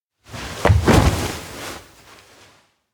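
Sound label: electronic hug slow; anemoi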